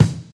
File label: sharp kick sound e key; kick drum samples